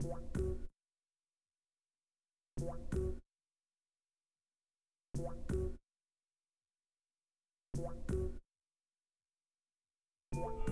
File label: Melody; Fat Set